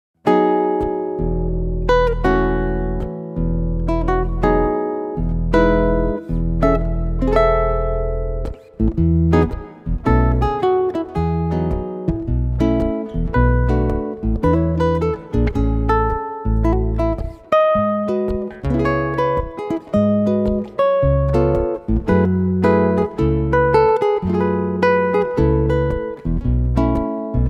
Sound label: Fingerstyle